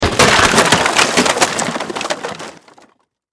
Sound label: Impact Crash Wood; Materials